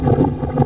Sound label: thin; tuxpaint; magic